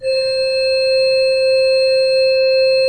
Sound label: SWL C4, Cathedral Organ; Propeller Island